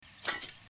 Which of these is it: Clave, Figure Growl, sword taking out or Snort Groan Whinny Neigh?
sword taking out